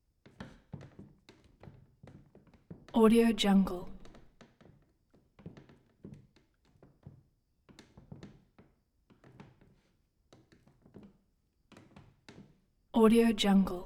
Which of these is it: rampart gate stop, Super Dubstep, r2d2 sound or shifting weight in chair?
shifting weight in chair